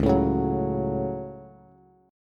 Db Suspended 4th Sharp 5th, Bass; 9,12,11,11; strummed

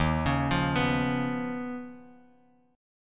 Eb 7th Suspended 2nd Sharp 5th Eb, Bass Standard; 11,16,15,16; Eb7sus2#5 arpeggiated; JGuitar

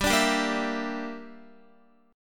G Minor 6th, Guitar; strummed; 4E,4A,5D,5G,5C,6F; Gm6; x,10,12,12,10,11

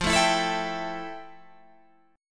F Major 9th F, Guitar, Open G

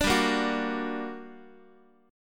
G Minor Major 7th, down, Guitar; GmM7 strummed; x,x,5,5,2,5; JGuitar

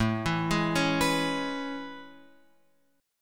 A Major 9th A, chord; AM9 Chord {5 7 6 6 X 7} arpeggiated

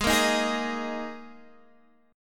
G sharp Suspended 2nd chord

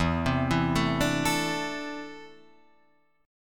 E 7th Suspended 4th Sharp 5th, Guitar, Open F; E7sus4#5 arpeggiated; JGuitar

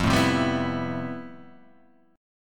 F Major Sharp 11th; strummed; JGuitar

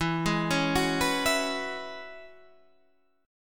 E 6th Add 9th E down; E6add9 arpeggiated; JGuitar

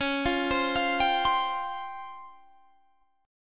C sharp Major Sharp 11th; C#M#11; arpeggiated

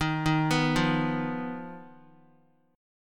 Eb Suspended 2nd Sharp 5th Eb Guitar; Ebsus2#5 arpeggiated; JGuitar

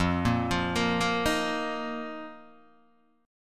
F 7th Suspended 4th F, Guitar, DADGAD

